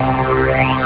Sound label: p string; coma; Amiga Soundtracker Sample Packs